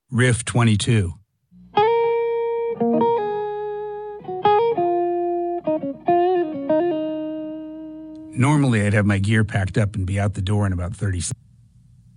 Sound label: Riff